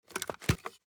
sgputer rotate; tacz; daffas arsenal

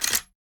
boltback; tacz; daffas arsenal

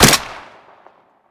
single shoot; tacz; daffas arsenal

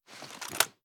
mp5k reload; tacz; daffas arsenal

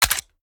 mag out; tacz; daffas arsenal

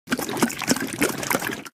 pour; tacz; daffas arsenal

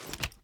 mg3 raise; tacz; daffas arsenal

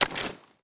draw; spasi15; tacz; daffas arsenal

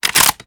bolt, mg3; tacz; daffas arsenal

